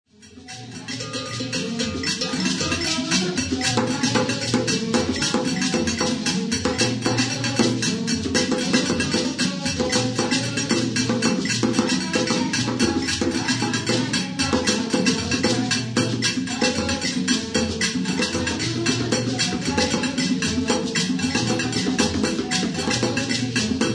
dondondo; ghana ashanti

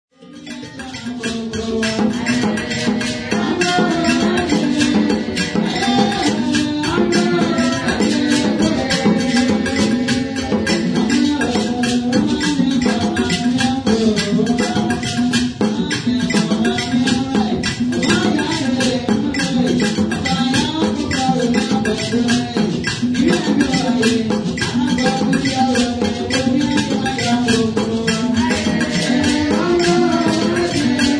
adowa nwomkoro, ghana ashanti

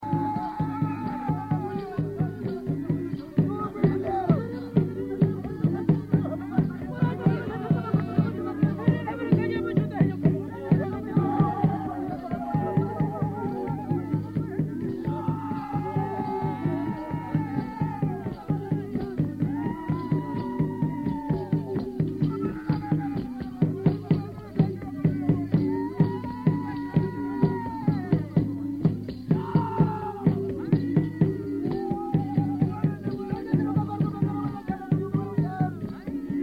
ingaraba, rwanda

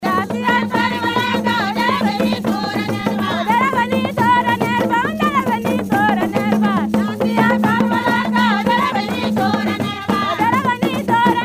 lung doo, ghana, frafra

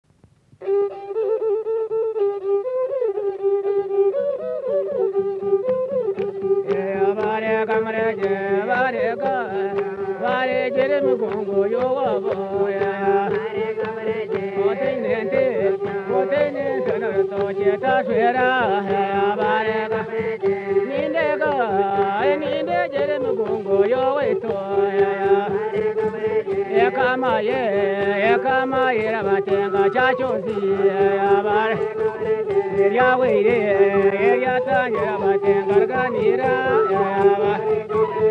Enyngu, uganda

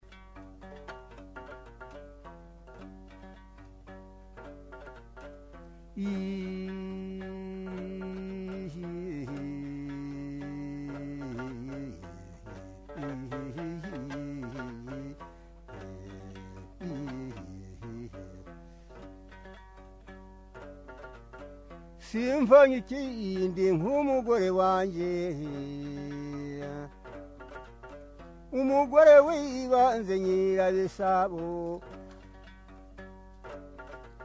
inanga, rwanda

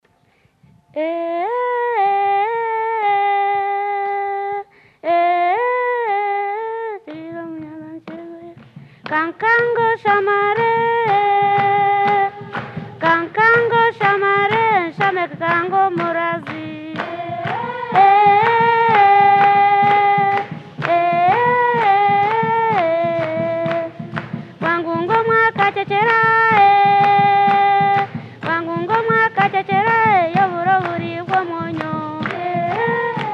Engalabi, uganda

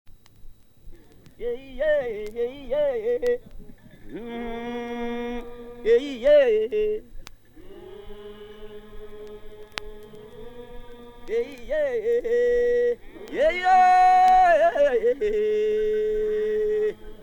Ekitaaguriro Iru, uganda

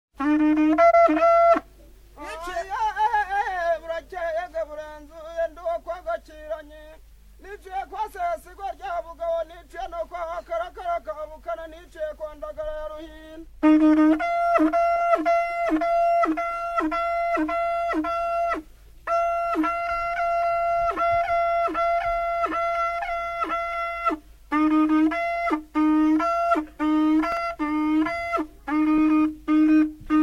ihembe, rwanda

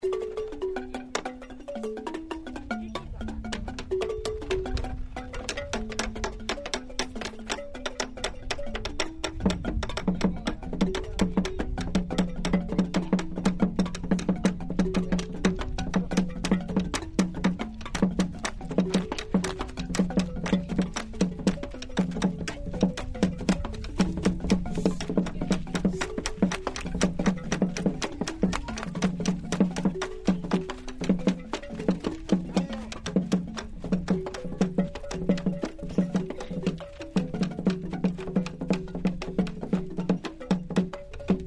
gyil and bambam

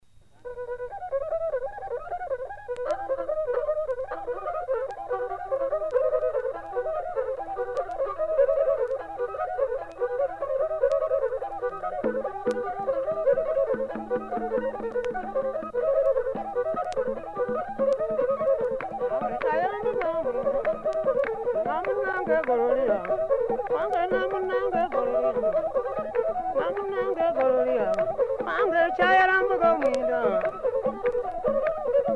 Endigiri, Ziba, uganda

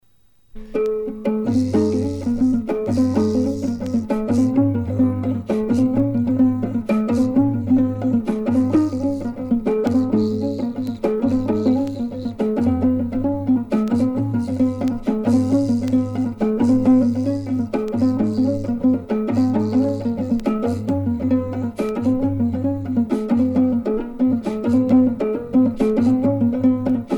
tibesti keleli; tchad

